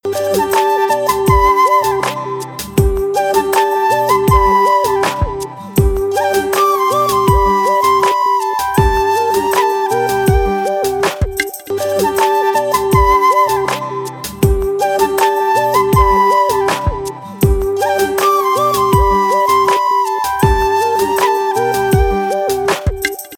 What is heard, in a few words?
beautyfull flute; tamil ringtones; oru naal koothu